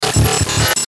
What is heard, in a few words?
Glitch Buzz Warble; Blastwave; Glitches